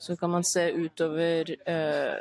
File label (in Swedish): Skrik; Viewfinder